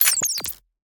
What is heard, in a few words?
Grenade Cancel